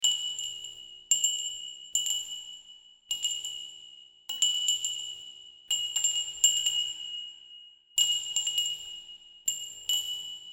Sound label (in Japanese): ガラス の 風鈴